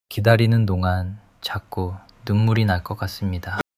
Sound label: crying